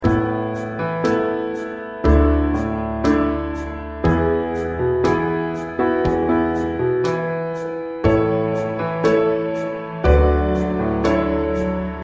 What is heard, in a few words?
Accompaniment